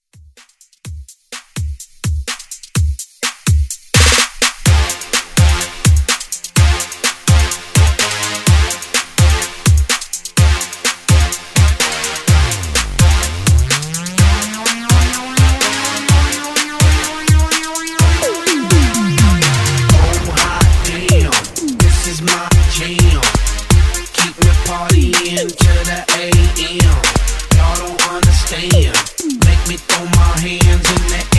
Throwback Redrum

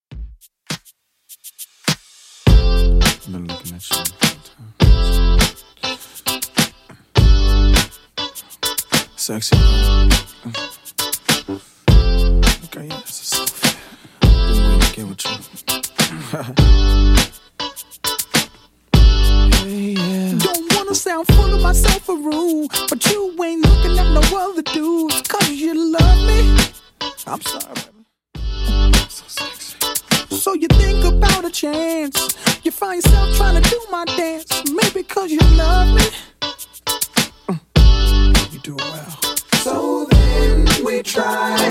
Throwback Rnb Redrum 102bpm

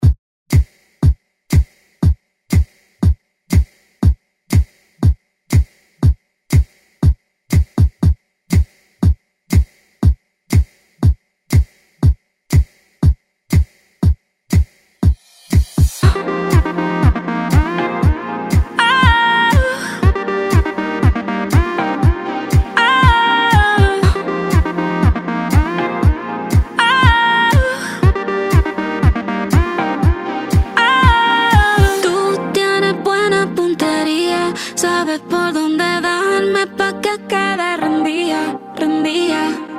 Dance Ext Redrum

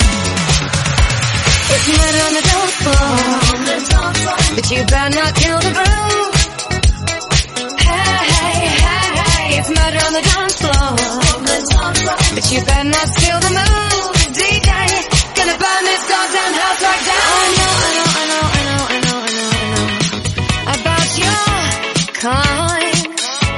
123 bpm E

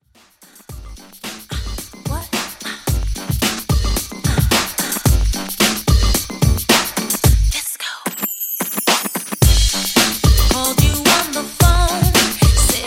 Classic Redrum 110Bpm

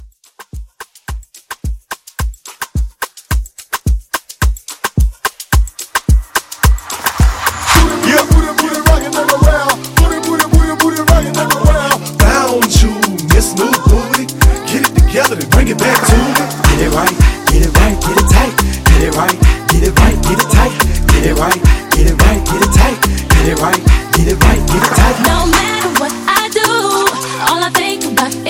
Moomba Booty Hype 108

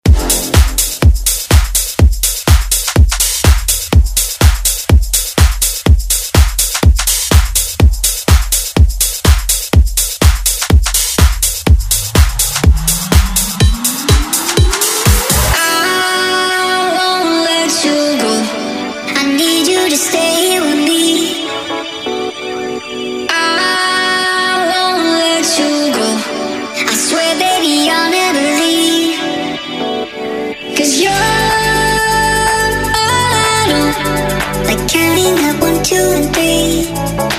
House Redrum Intro

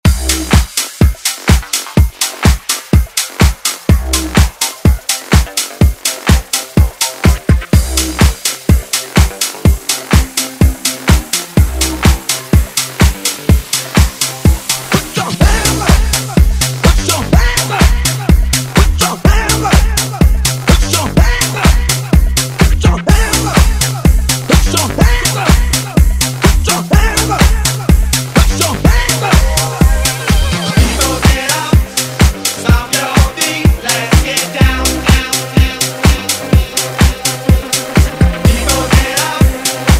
Dance; Redrum

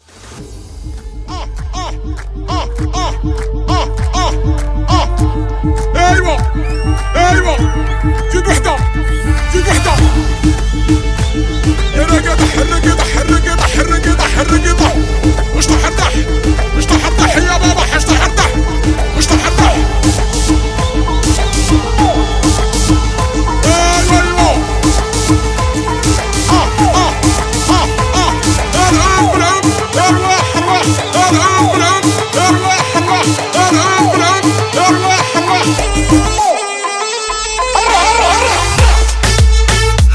Trumpets; Hybrid Edit

